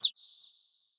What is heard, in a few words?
Pollito Chirp; Clawberta